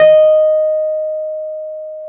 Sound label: PNO T.Wurly D# 6; Keyboards of The 60's and 70's